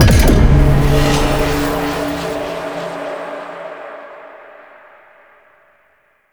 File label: Portal Enter; Tau; Proto Frame